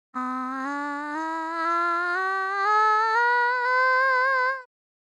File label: doremi